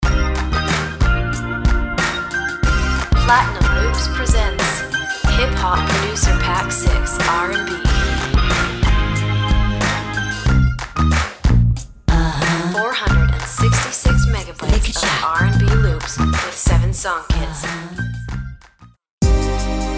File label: hip hop producer pack